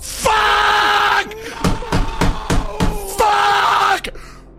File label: burnt peanut desk slam